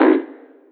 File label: Oneshot; Sonic Foundry (Sony Creative Software); Esoterik Beatz; Messup